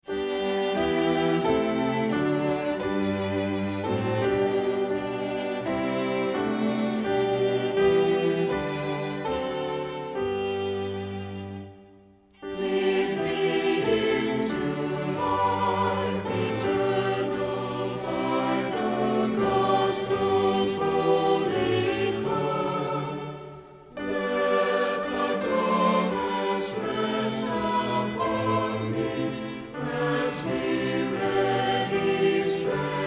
words and music

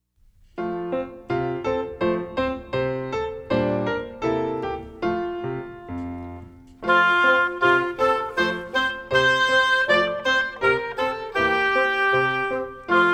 music only; to get quiet

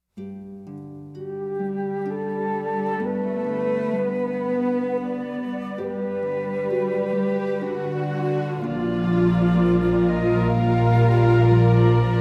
music; the promised land; book of mormon videos

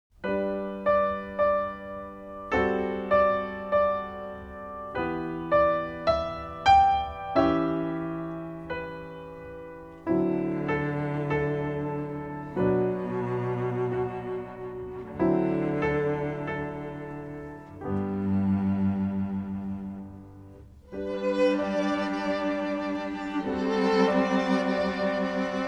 to a wild rose prelude music only

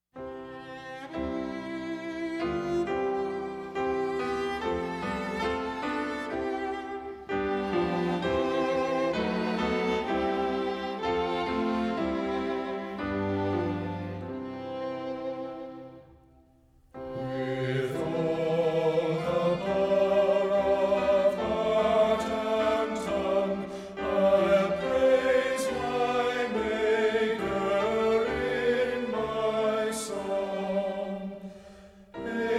words and music; with all the power of heart and tongue

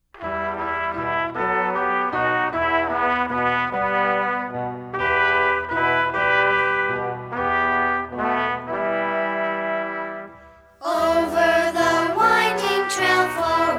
words and music; westward ho

